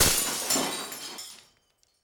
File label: window smash